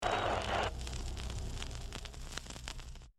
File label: radio static; Bruitages